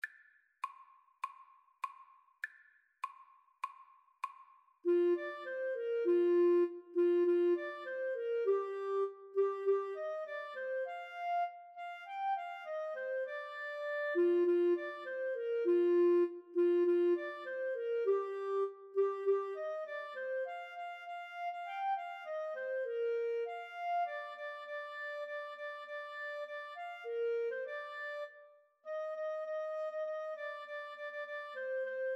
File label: clarinet saxophone duet